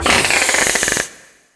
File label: barracuda hit; DAoC